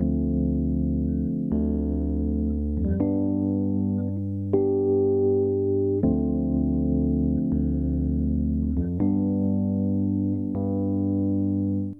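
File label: Rhodes 80 D minor; ProSamples vol.34 - Hip Hop & RnB 3; Got Rhodes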